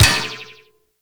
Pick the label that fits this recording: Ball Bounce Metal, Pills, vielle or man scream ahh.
Ball Bounce Metal